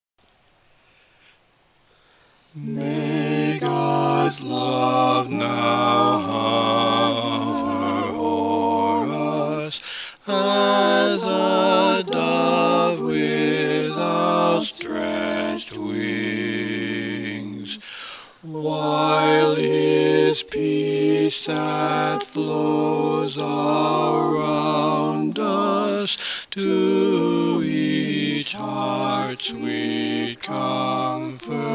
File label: bass voice